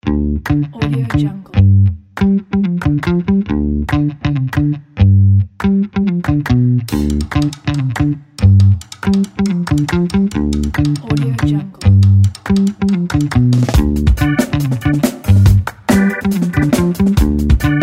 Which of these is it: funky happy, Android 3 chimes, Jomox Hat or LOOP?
funky happy